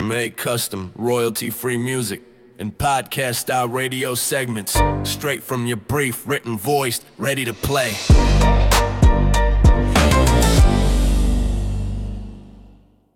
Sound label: HipHop Segment; haha fm